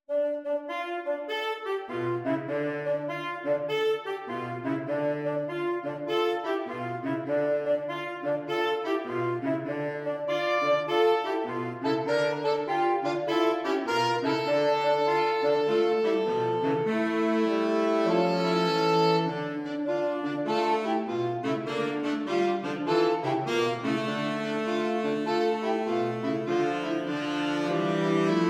Minimal Jazz

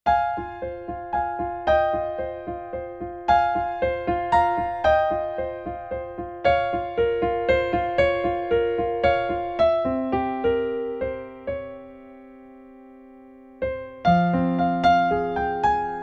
piano solo; piano solo remixes of childhood songs

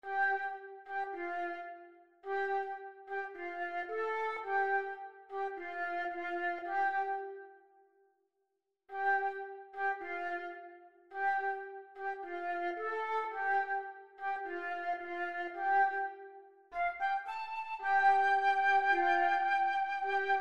Stormwalkers Flute duet

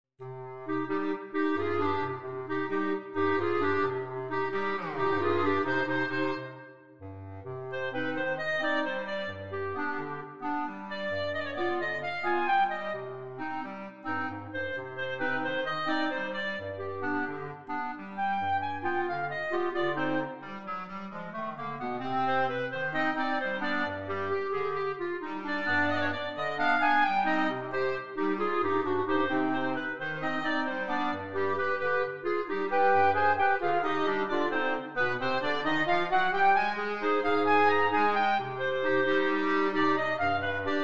Clarinet Quartet

